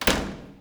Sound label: Door Slams; doorSounds